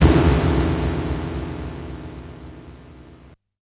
ショック (FM音源版); Xak II; MSX

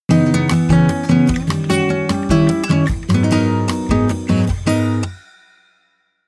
ジングル

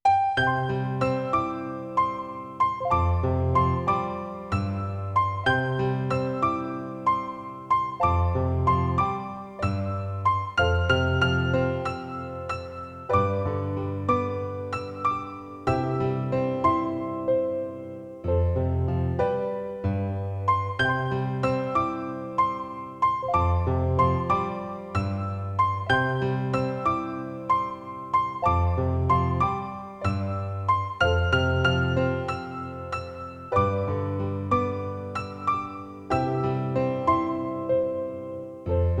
ピアノ; Hokenshitsu e Youkoso

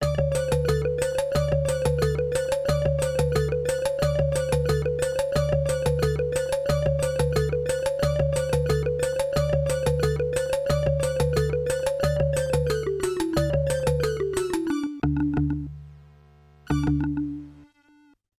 FM音源版; fray msx remastered soundtracks 2008

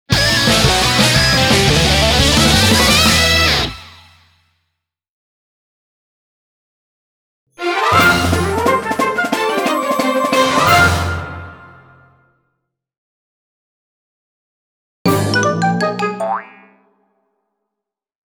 ジングル集; Magical Halloween 2

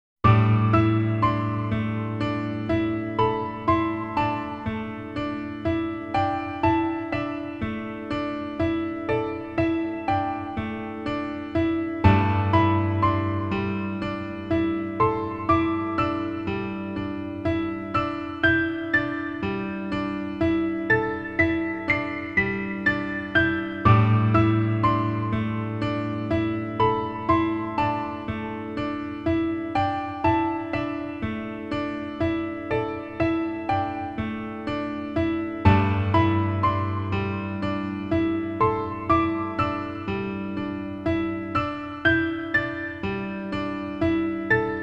ピアノ; Hokenshitsu e Youkoso

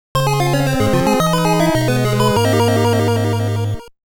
ゲームオーバー; Kono Subarashii Sekai ni Syukufuku wo! Kono Yokubou no Ishou ni Chouai wo

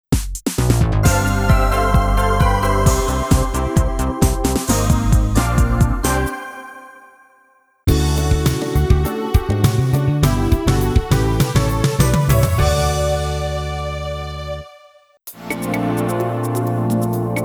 ジングル; zutto issho original soundtrack 1998

